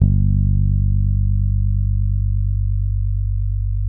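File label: fret finger G, Pedulla bass; Masterbits CLIMAX 7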